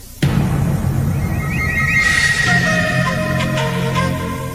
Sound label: canadian television ident; ctv